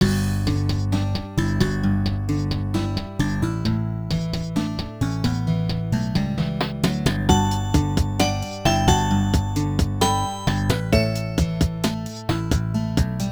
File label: INSIDE HOUSE